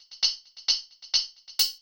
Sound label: HiHat Loop, Dance Beats